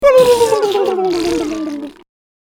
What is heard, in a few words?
Death